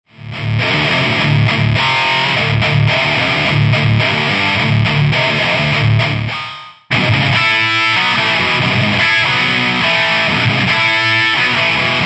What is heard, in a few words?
vibro witchdoctor